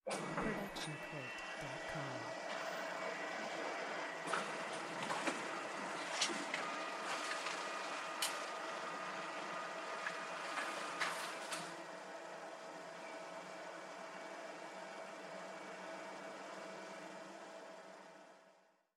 printer printing; soundscrate

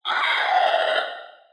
dino screech; SoundsCrate

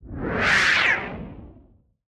Anime Air Movement

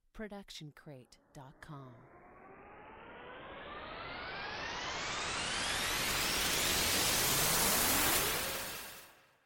Cinematic Riser

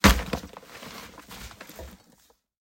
Wall Break

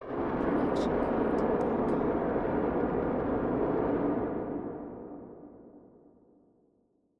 missile rumble; soundscrate

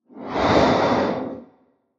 airy whoosh

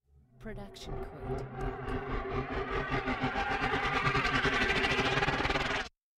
Low Charge up; SoundsCrate

